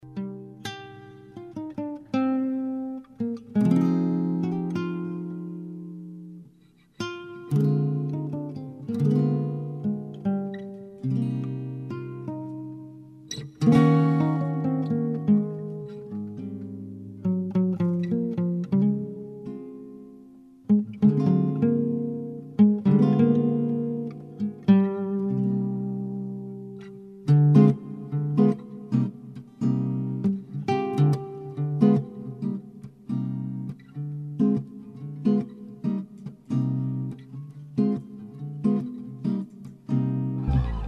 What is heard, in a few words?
Acoustic percussion Jazzy